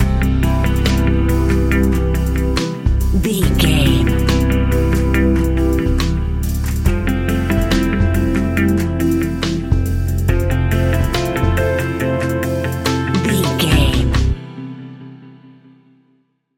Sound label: coastline views stinger